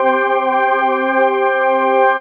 dance chord

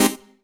dance chord

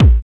bd soft; percussion

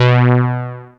Fat Groove; instrumente

